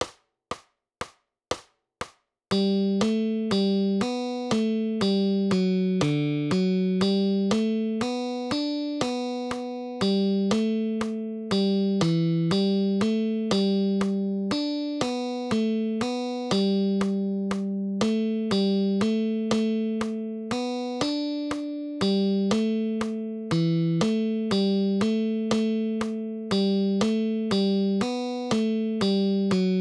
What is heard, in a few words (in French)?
gigue, guitare